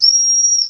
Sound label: dog, Whistle